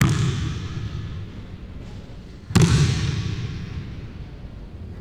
basketball dribbling on indoor court; sports

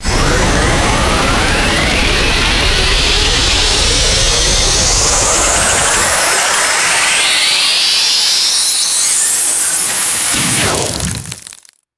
freeze ray power up shoot and crackle; lasers

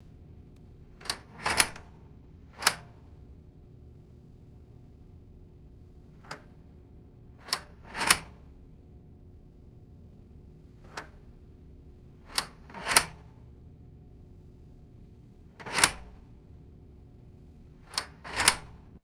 metallic deadbolt latch clicking repeatedly; doors

